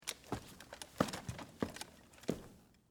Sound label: Wood Ladder Down, Walk, Down, Fast, Interior, Perspective; BlastWave